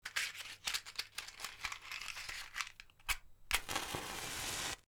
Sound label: matches; nature